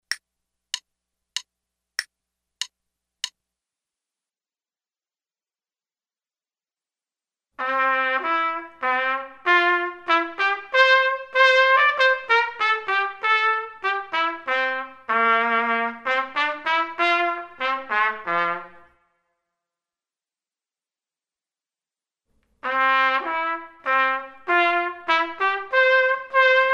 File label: trumpet 2, Minuet; metronome setting for this performance is eigth note = 96; Selected Duets for Trumpet, Page 23 Number 20, Minuet, performed by David Summer.; Trumpet 2 Only